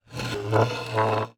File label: Wood Chair Slide Across Wood Floor, Household